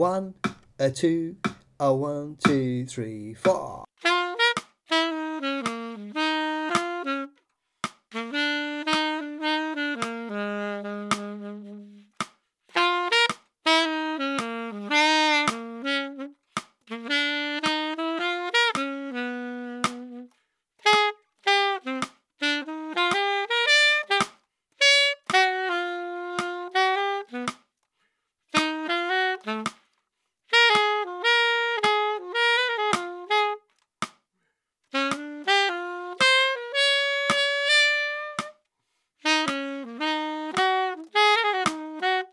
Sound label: Happy Blues ALTO; Alto Sax; Lockdown Saxophone Exercises